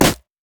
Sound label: blue minion melee basic attack; LoL